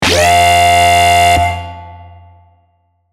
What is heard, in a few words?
bad machine